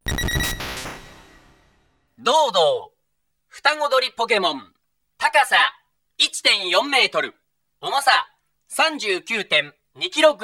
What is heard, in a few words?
doduo, Pokemon Gameboy Sound; Pokemon Gameboy Sound Collection